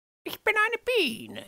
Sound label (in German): biene